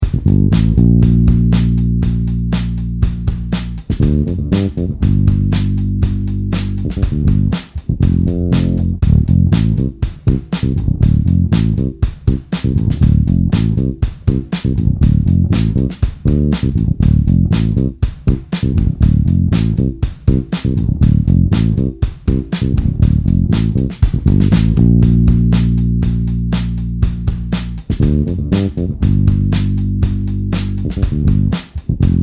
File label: Bbmaj7 Gm7 Fmaj7 to Dm and back